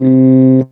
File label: Talk Box "ee"